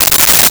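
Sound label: Ratchet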